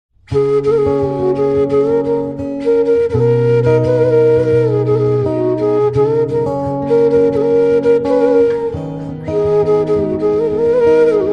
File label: song instrumental bgm; rajkumar rao